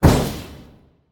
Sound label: Victory Poof; smite; izanami